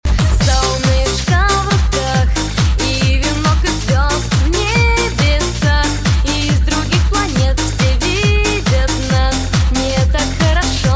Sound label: russian techno